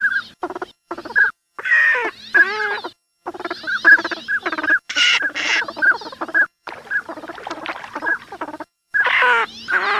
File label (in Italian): codone, birdsound